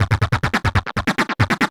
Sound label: Industrial 140